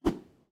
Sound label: chain Sound; hexhollow